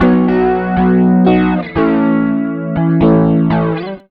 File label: rock, acoustic guitar loops